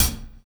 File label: Hi Hat; Sonic Foundry (Sony Creative Software); Drums & Drones; Construction Kits; Node Kit